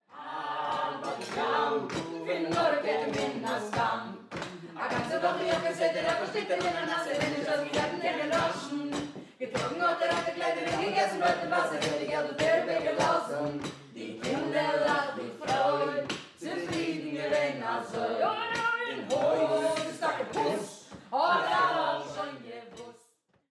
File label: Before The War Singing At The Table